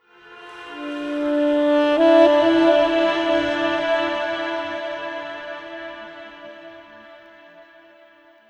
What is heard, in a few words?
Sax